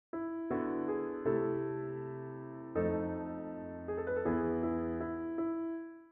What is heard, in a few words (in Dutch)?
gealtereerd, gealtereerde akkoorden